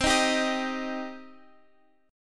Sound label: C Minor, Guitar, Half Step Down